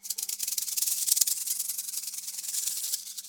move, snake; Garry's Mod; hungry snake

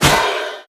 throwable throw; weapons; breadmonster

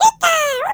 skelly small

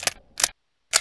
revolver world reload; tf2

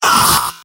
scout pain sharp; vo; tf2; mvm

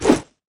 boxing gloves swing; tf2

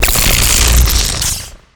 invuln off vaccinator; Garry's Mod; tf2

